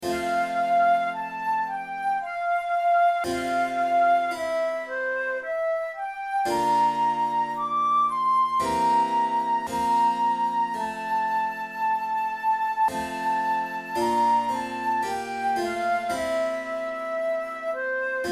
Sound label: recorder sonata in d minor largo; marcello op2 no2